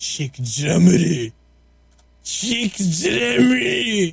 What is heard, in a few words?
drummer